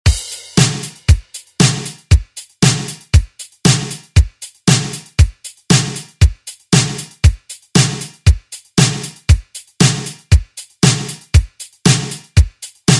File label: 80s ReDrum; DJ Mhark